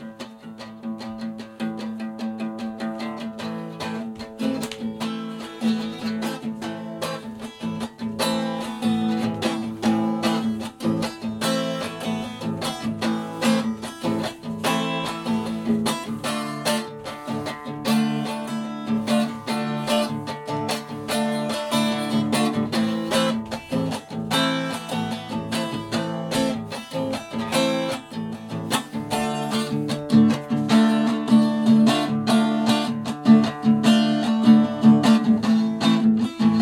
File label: drivin blues